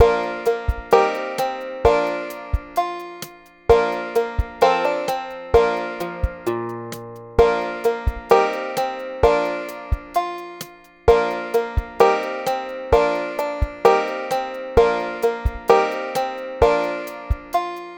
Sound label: accompaniment